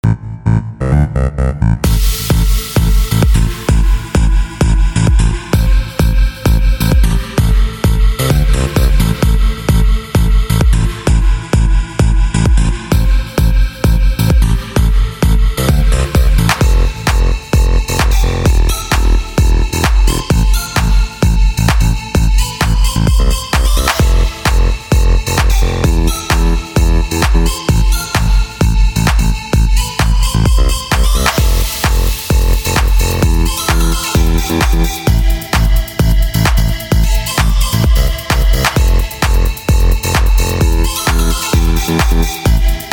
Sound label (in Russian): klubnaya melodiya bez slov